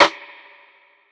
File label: snare; dough-samples; eddyflux